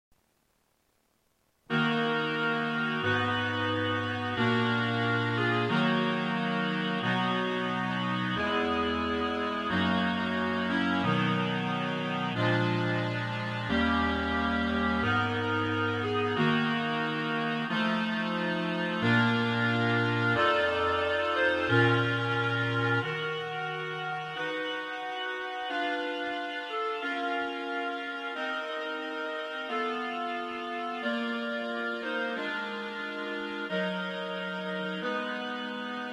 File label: prelude funeral march; chopin frederic